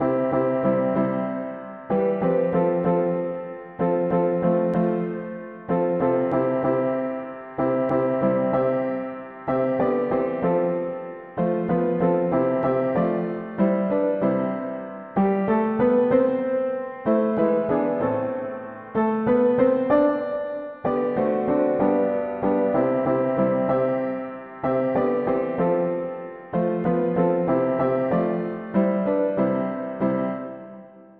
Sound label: mono piano; Magnifions le nom si beau; hymns